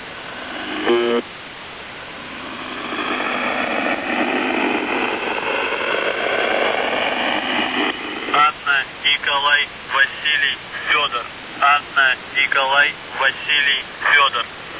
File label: buzzer usb msg